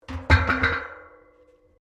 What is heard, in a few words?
Pipes Metal Impact Tyre; Metal (Sound Effects:Materials)